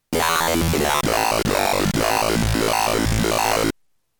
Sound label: Phase Distortion Synthesis